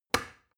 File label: plastic button start push heavy